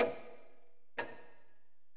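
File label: clock tick; REDNECK; RAMPAGER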